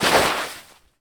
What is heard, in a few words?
Baseball Slide Dirt